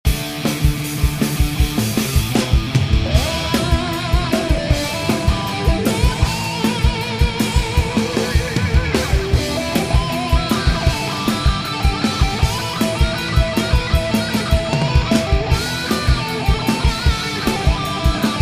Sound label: Freedom Solo